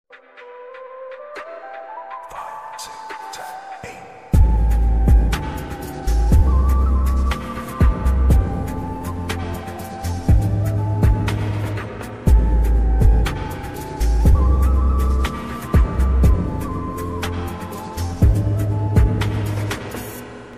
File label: lofi version ringtone female ringtone flute ringtone